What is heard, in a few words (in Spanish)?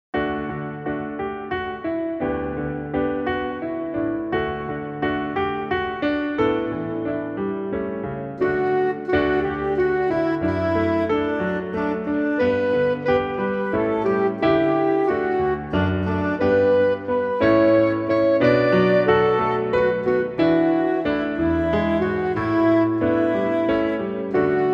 Pista con melodia instrumental